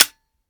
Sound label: fire dryfire; gmod; viper